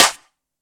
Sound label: Crispy Acoustic Snare D Key